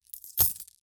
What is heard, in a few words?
collecting gold coins fal